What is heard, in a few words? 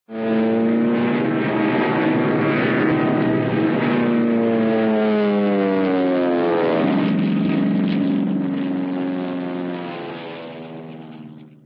airplane, prop short dive; cartoon